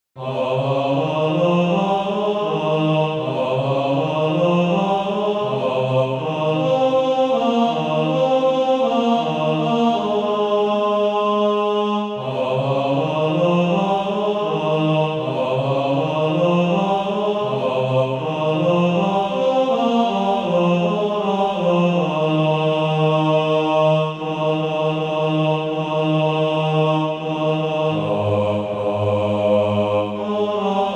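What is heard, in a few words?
bass, SATB Practice Music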